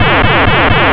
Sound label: laser